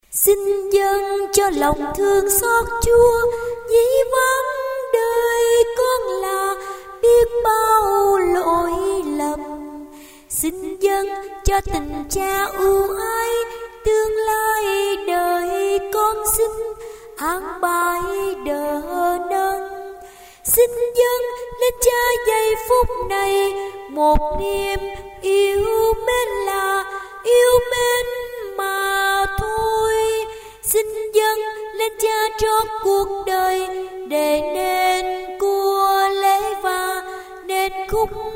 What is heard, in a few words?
sop alto